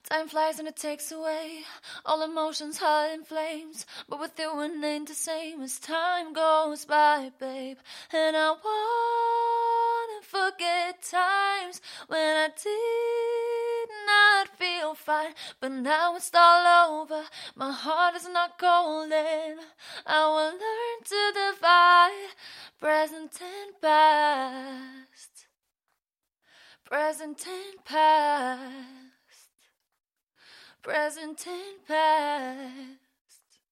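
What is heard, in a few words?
pop female, 120, Eb major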